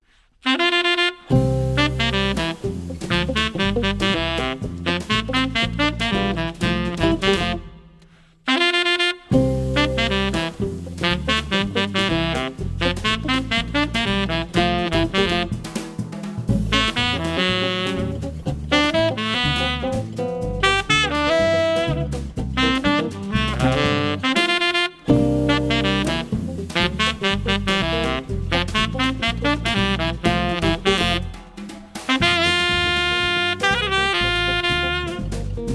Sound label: quartet, latin ensemble